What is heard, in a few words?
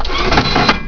SERVO, MACHINES